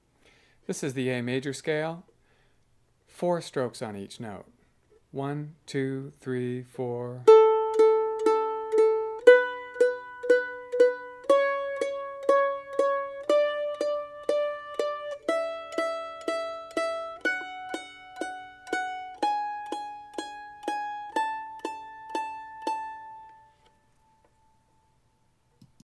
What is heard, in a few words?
A Major scale - 4 strokes